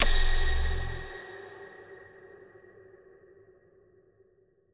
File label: Screamer; game audio; Mita; MiSide